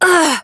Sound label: Generic Short Pain, Operator Voice